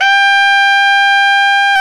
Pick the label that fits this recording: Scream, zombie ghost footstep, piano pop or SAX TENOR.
SAX TENOR